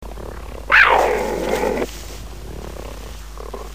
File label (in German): paarung ende, Geparden